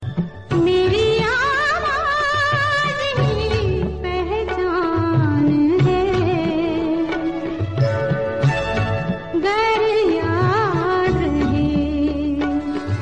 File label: Old Song Ringtone